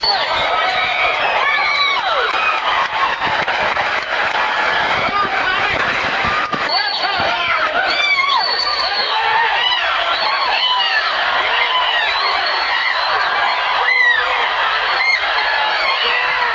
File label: crowd